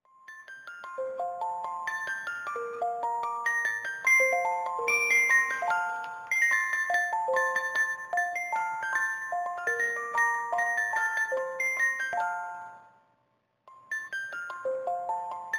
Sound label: jingle